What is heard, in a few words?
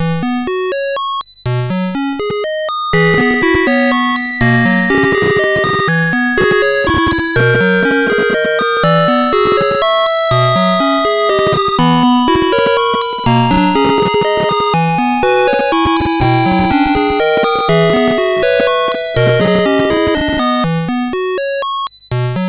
Musicbox, sid MOS6581R4